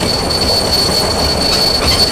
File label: Subway braking; ambient